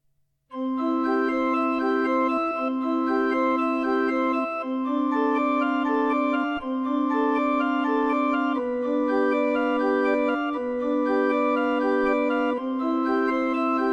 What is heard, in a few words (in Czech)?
Ave Maria varhany; Ave Maria - Prelude (Bach)